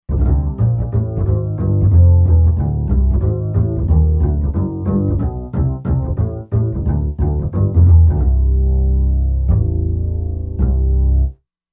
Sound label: Doubled Bass, Roland Fantom XR